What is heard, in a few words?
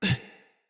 Pain, Battle Barks; Envoy